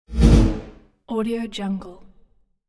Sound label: deep bass whoosh with reverb; hollywoodedge